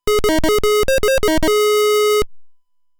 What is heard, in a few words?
Spurt Sound; BeroBero